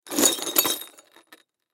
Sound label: Debris Glass Move, Foley Debris Glass Movement Clank; Debris Sound Effects; Materials; Blastwave